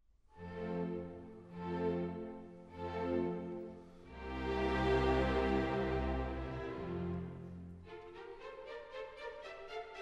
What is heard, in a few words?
Concerto for flute, harp & orches